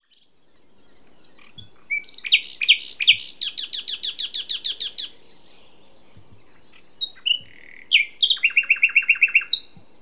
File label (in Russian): yuzhnyy solovey luscinia megarhynchos, zvuki peniya ptits